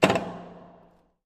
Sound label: Elevator Stop On The Floor; hinges creaks